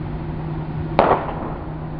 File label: ShotSpotter